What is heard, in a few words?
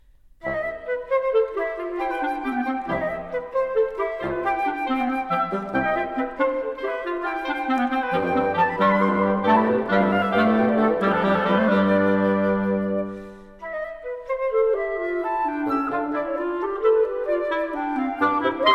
Quartet In C For Woodwinds, Allegro Moderato